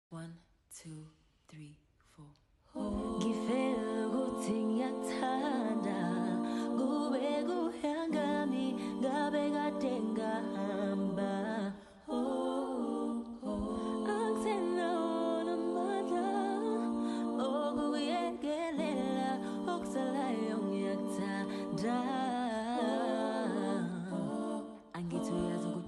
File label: Acapella Version